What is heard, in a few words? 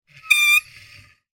foley clothes hanger slide scrape on metal rail external freestanding